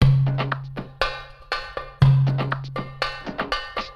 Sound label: sound machine sample; hof furni; arcturus morningstar default swf pack